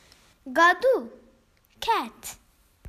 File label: Cat